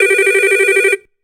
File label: ringer classic prism, ringtones; ZTE Open-C